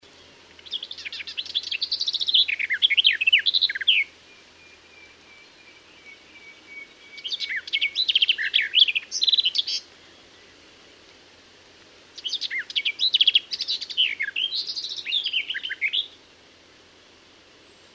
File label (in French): Fauvette des jardins